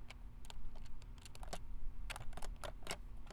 Plastic Fidgeting; BB Retail Therapy Sample Pack

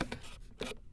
Smooth Texture; BB Retail Therapy Sample Pack

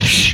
Swipe; retro arcade

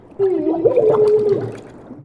drown, male; warfork

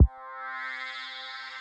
Whine Kick, Drums; BB 2HTC Samples Vol 4 Addendum

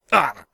pain, male; warfork

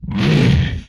Inhuman Attack, Vocal; retro arcade

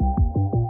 Smol Bass Run; Solos; BB 2HTC Samples Vol 4 Addendum

